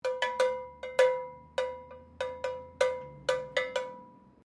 HORSE BELL FORGED RINGING; Various old or antique devices; Bells (Sound Effects:Musical)

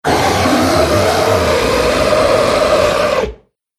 Nightmare Beast Roar; Monster Sound Effects

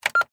Office Fax Machine Press Button Beep; Fax Machines; Electronics

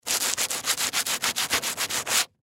Sand Paper Scrape Unfinished Wood Fast; Sanders; INDUSTRY; Blastwave

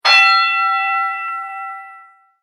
Boxing Fight Bell Single; Boxing Sound Effects; Boxing (Sound Effects:Sports)

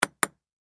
Sports Ping Pong Ball Hit Double

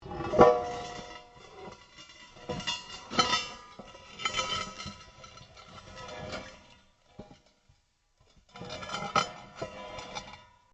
METAL ON METAL LARGE MOVE; Materials

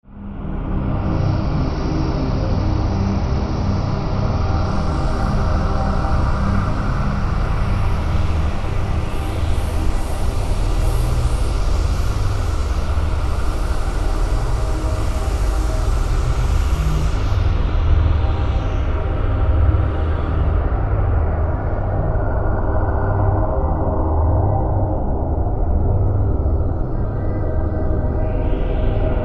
Synth, Stab, Drone, Horror, 5.1

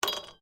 Ice cubes Glass; Drink Mixing Sound Effects; Drink Mixing (Sound Effects:Bars)

